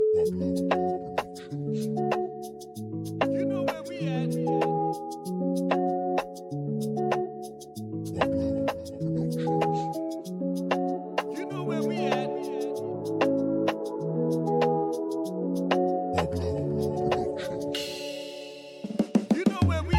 Highlife

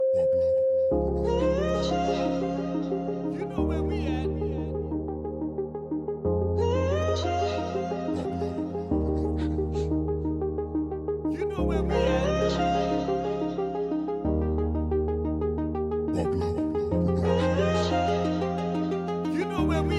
POP EDM

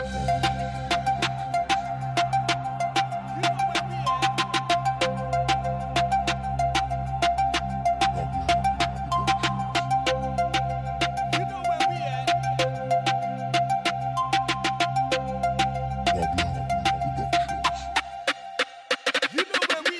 Reggae Dancehall

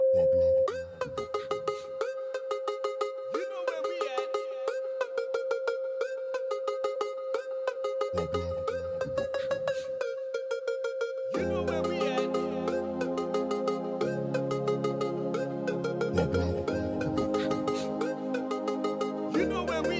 Reggae Dancehall

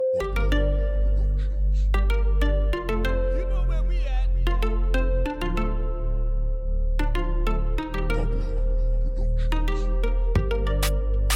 Latin Reggaeton

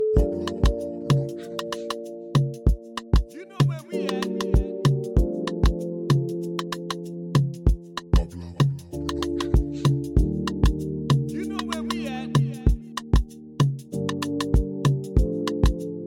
Amapiano

